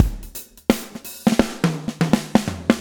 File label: SWNG, SWNG SHFT